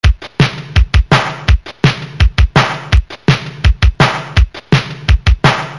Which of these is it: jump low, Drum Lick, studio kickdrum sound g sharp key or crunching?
Drum Lick